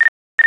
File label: moto beep